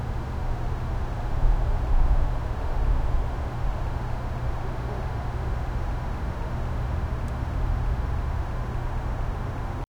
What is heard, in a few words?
city